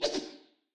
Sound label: anime whoosh twice